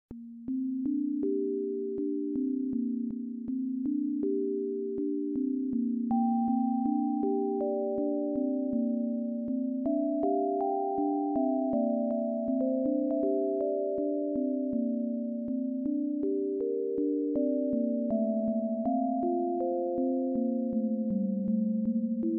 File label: bell